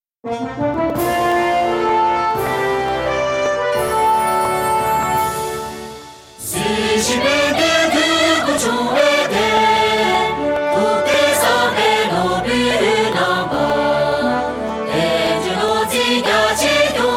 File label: Tibetan National Anthem